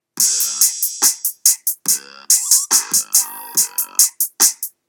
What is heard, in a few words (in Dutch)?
Muziekfragment 90S